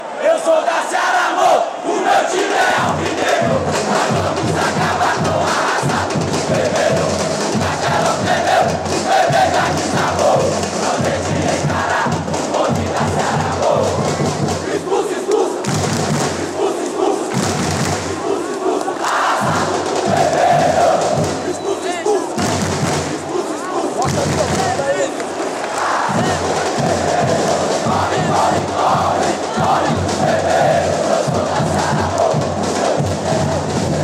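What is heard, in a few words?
ceara chant, football chants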